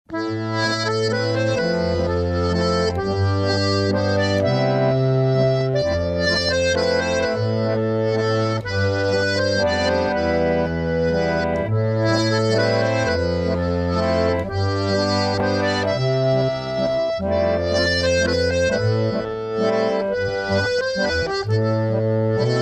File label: mazurka part